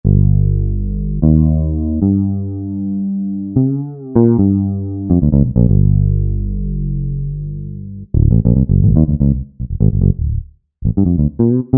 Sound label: J Bass; Sonic Reality Modern Songwriter